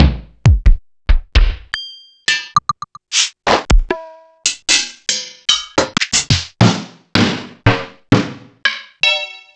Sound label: Korg T3